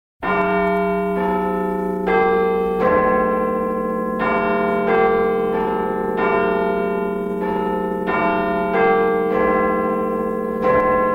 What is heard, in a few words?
sound effects big ben; bbc